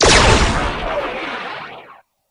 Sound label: arm cannon shoot; crush